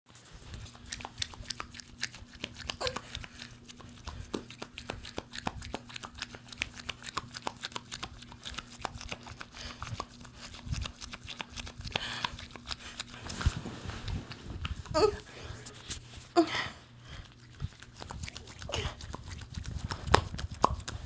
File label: wet sounds; Extra quick